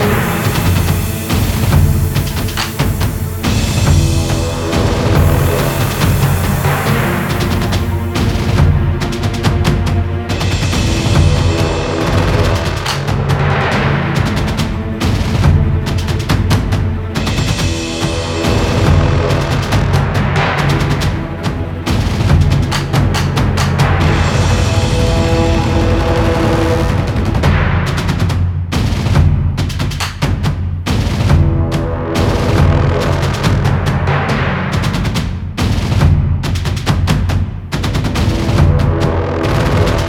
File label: TENSION LOOPABLE